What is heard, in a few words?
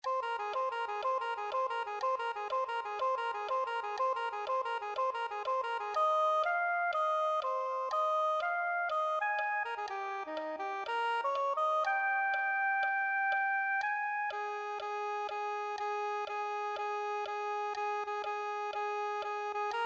soprano saxophone